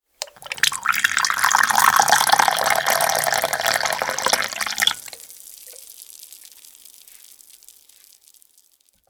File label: Pouring coffee